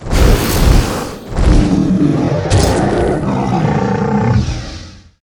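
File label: Boss Dark Dragon death; monster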